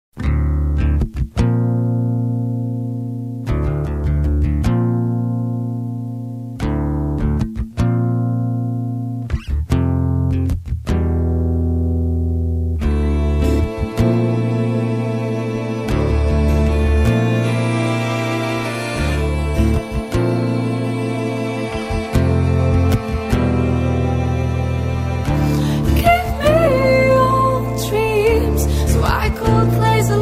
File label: Some Days acoustic